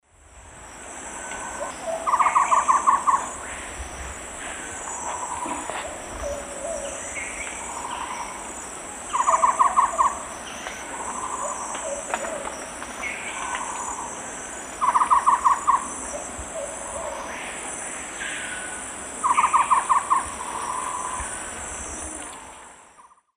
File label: Mytmothera campanisona, calls 2 birds; S. America; Eastern Lowlands